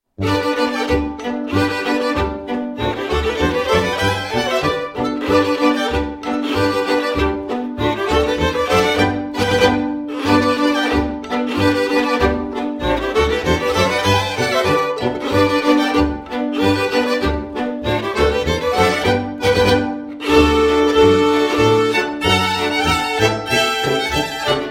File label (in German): Schottischer